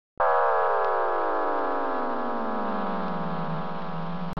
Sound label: disoriented; uwc3x